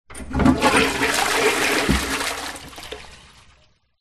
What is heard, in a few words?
public places public toilet flush half eco close up